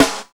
DANCE SET, DRUMS

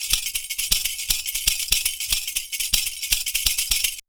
PERCU; Univers Sons; Raricussions

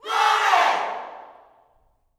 GLORY, Gospel, YELL SHOUT; Spectrasonics; Vocal Planet

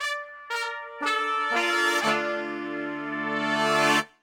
FANFARE LICK, Brass; Best Service; Brass Super Section

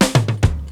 LOOP, ROLLS; X-Static Goldmine 2

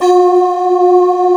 SOLO VOX G4; Greytsounds; Scott Peers Sound Engineering

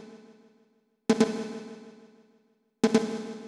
TOM ROOM, Techno 138 BPM; Best Service ProSamples vol.54; AKAI; HI ENERGY

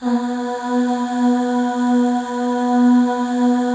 Voices, FEM AHS; Spectrasonics; FEM.2 AHS

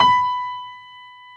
PF STB B 4 L, S GRAND PF

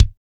kick, lite jazz, Roland; Northstar; Drumscapes Roland; AC Lite Jazz; Kicks

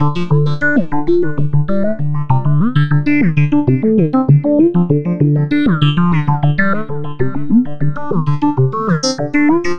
VA Synthesis, Keyboards

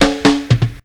110 break, Ragga & Dub Reggae; USB Soundscan vol.27; AKAI

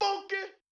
Funky; Yamaha DTXpress-II

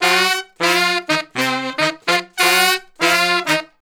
Horn Section; Traditional; Sonic Foundry (Sony Creative Software); Jazz Solos and Sections

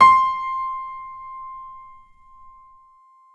PNO F; Synclavier Sampler Library

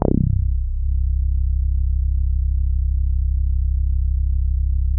Synths BASS

